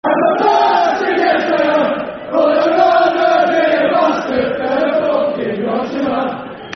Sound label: Football Chant, Soccer Songs; I'm A Bastard; Oldham Athletic Oafc